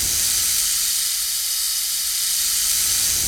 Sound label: stinging loop; venomancer